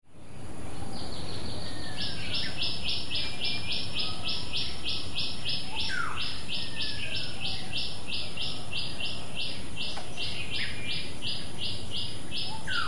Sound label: Tailorbirds barbet